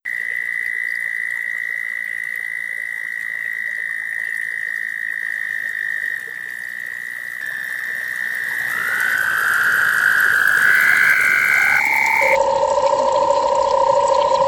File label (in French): crapauds